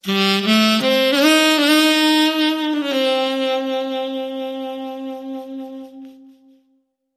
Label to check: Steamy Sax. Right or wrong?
Right